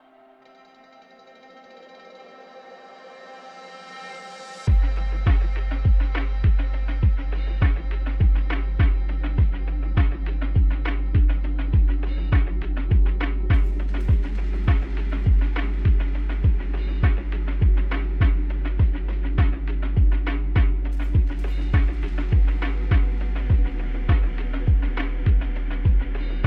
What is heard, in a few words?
Light Cardio Workout Soundscape